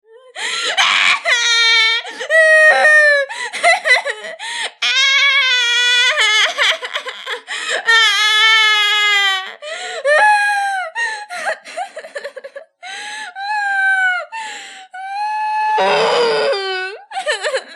小朋友凄惨的哭声

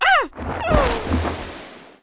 女 死亡 倒地

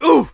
男 受伤; renlei

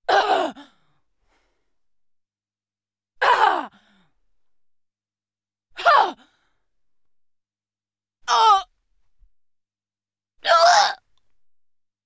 女人恶心、吐; renlei

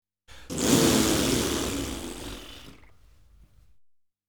众人唏

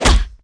女被拳击中; renlei

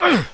男受伤; renlei

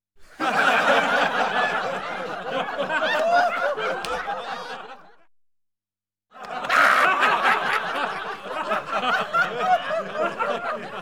笑声(众人、成年、短)

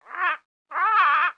婴儿呢喃

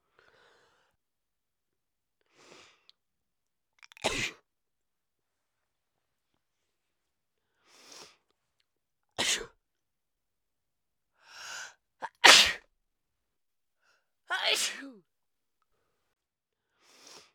女人打喷嚏; renlei

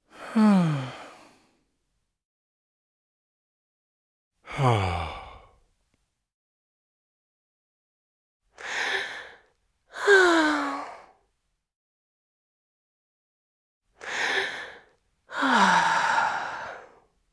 叹气

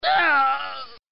痛苦

